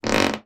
wood creak floorboard; foley; sound effects; sound effects one

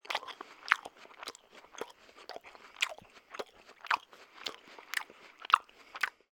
person eating apple

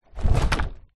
household blind fabric old fashioned movement

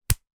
foley rubber wrist band stretch release slap

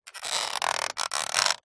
plastic creak stress; zapsplat; foley

